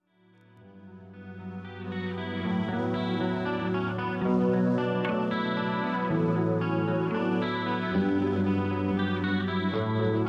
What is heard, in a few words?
Accidental Guitar Riff